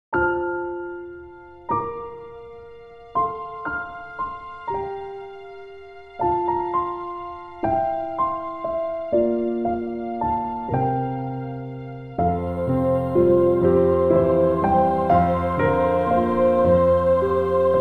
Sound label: Peaceful Landscape; Peaceful Landscape 2; 차분 평화로운